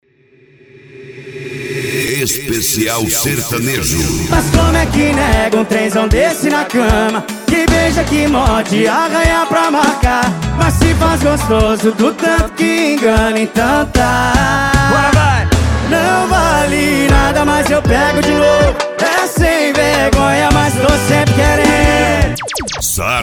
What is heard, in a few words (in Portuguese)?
sertanejo; reboque poseidon e sartori sound car